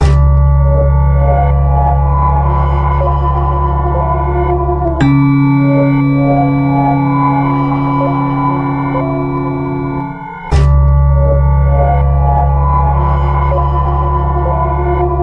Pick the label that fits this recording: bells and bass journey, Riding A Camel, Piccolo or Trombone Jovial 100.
bells and bass journey